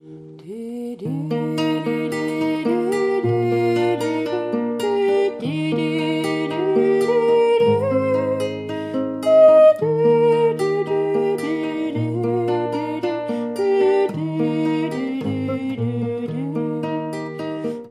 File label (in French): couplet fredonne